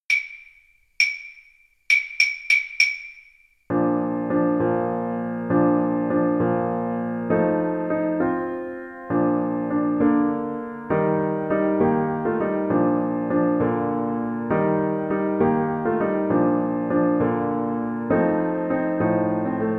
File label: horn, French Horn